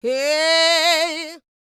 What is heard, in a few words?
D YEAH, Vocal R&B Dance; DB DANCE